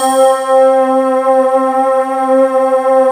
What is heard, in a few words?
FUTURE PAD, ROLAND D-50; Rubber Chicken Software Sample Bank